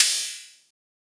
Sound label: Hihat Open Electronic